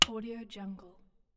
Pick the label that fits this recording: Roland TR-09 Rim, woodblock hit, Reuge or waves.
woodblock hit